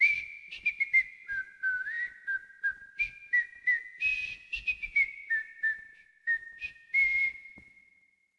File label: Whist